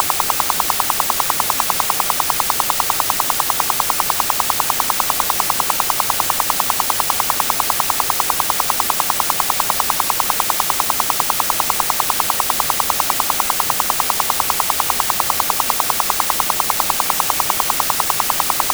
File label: Notched noise; ORL-přednášky, semináře